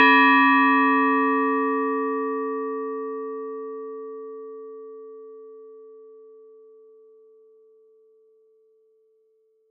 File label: single bell